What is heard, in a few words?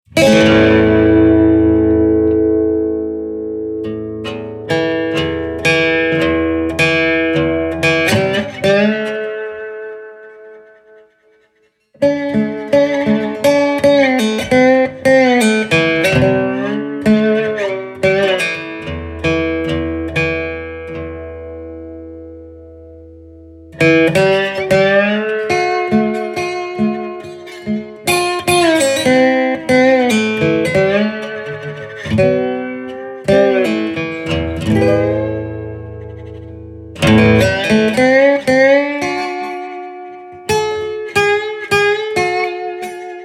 Reso Slide